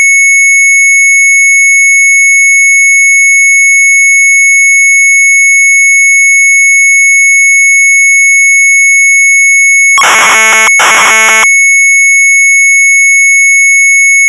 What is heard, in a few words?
Sharp MZ800 Tape; retromelsarchive